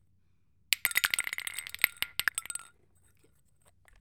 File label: Dropping Plastic Shot Glasses